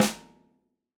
snare, gretsch kit

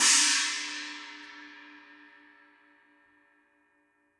china, gretsch kit

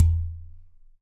Udu Low

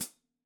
hihat foot, gretsch kit

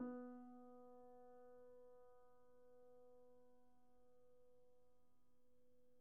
piano pp, steinway

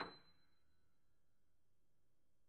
piano mf, steinway

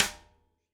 snare, gretsch kit

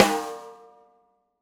snare, gretsch kit

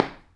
footstep

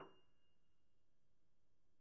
piano pp, steinway

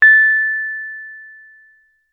rhodes mark ii a5; fender rhodes mark II

